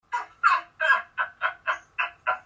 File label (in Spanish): risa